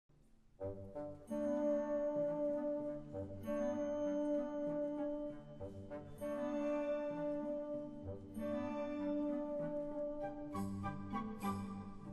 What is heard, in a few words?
Cha-Cha, Andantino con grazia